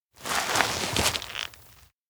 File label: leash pull; anemoi